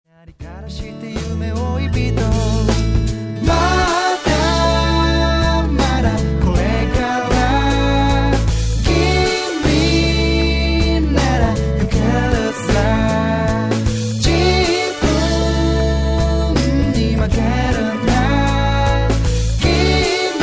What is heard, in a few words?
music